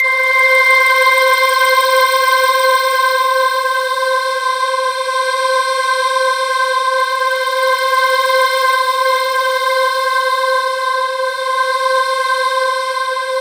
C5, Synth Line

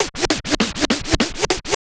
Scratch, Trance; Sonic Foundry (Sony Creative Software); Trance NRG VOL-1

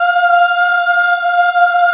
F4 child ah, choir; USB Soundscan vol.28; Acoustic & Synth; AKAI; CHILD AHF

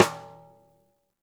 stickshot - snares on; JazzFunkKit

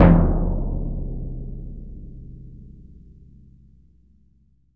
BS DRM MF; ILIO; Synclavier Percussion 2; World & Orchestral

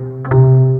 KOTO TREM C2; Big Fish Audio; Synth City

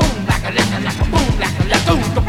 sly boom, Breaks

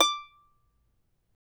charango D5; Spectrasonics; Hans Zimmer Guitars VOL-2

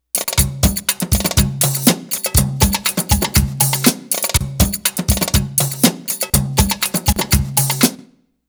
Arabic Rhythms

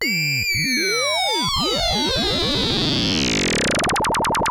Moog D#3; Hollywood Edge; Giorgio Moroder Rare Synthesizer Collection; MLTI.MOOG

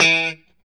POP E 3, STRAT guitar; Spectrasonics; Hans Zimmer Guitars VOL-1; JC-STRAT POP

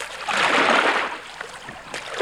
ROW, Boat Rowing; Water

